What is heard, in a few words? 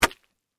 Splat Dish Rag Drop, Wet; Texture; Drops